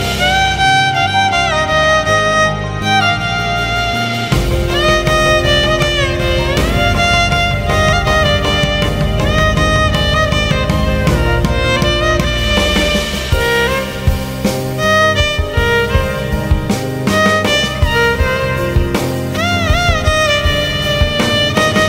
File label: Telugu Ringtone